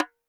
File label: CLAY FRAM, CAMEROON; AKAI S6000 CD-ROM - WorldSounds; CAMEROON PRC